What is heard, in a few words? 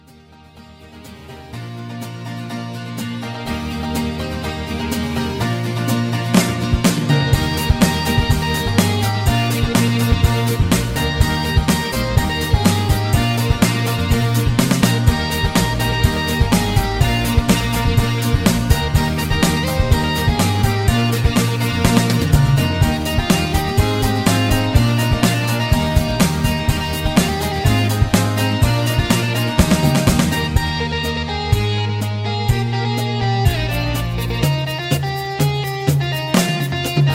high key with BGVs